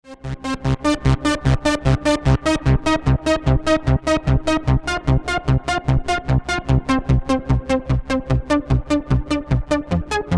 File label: Arp2600 polyphone 90er patch SRE330 3phase Mode